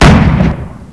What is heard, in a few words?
stug fire cannon; WAR